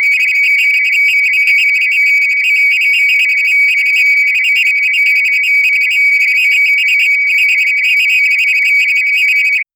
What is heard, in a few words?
harsh futuristic system alert